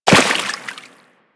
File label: Walk Water, Footsteps; Warframe; Operator